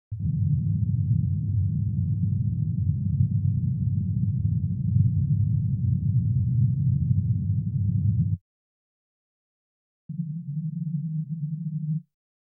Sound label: 100 Hz, 125 Hz, 160 Hz (1*3 oct) * -20 dB * L * Off * R channel * 125 Hz (1*1 oct); Denon Jazz Sampler Plus The Audio Tool Box II